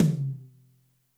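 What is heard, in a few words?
TOM soft; Zero-G; Chemical Beats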